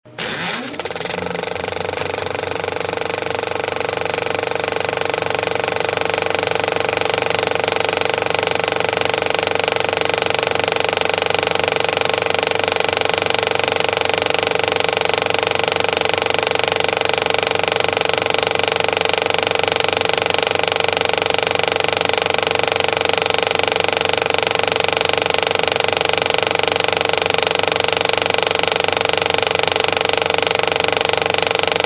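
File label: sounds of cinema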